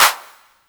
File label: Clap, retro house samples, Drum Hits